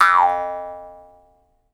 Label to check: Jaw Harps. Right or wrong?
Right